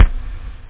kick; OctaMED SoundStudio V1; jazzies samples; perc

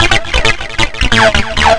Flange Loop; Guitar&Electro

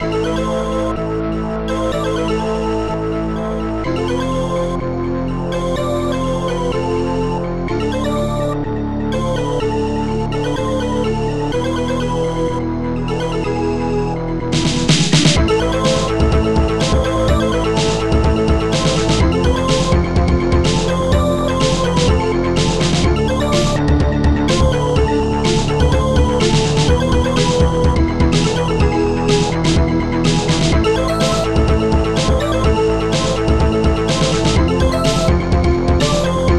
Dark Music; Clash

